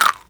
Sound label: Eat Candy; FlyForInsanity